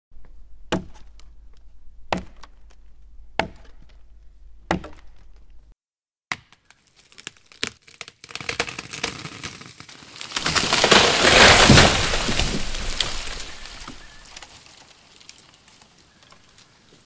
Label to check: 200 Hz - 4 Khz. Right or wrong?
Wrong